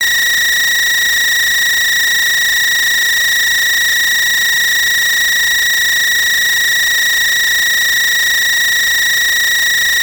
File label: smps 12 mA